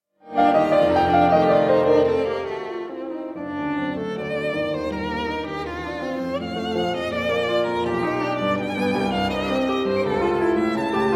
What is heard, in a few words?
sonata no 1 in g major for violin piano 1st movement